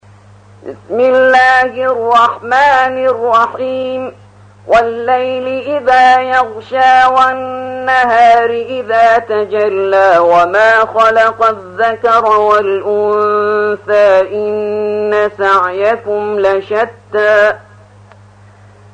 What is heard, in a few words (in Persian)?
ترتیل سوره لیل